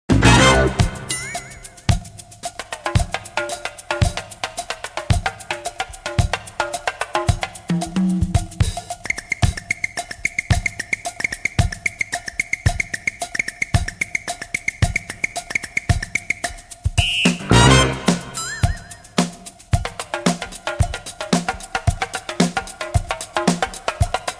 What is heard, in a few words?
Jam On The Groove